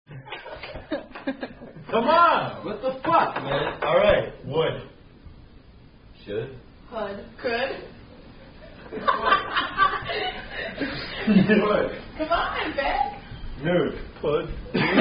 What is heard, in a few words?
Drinking Game Rhyme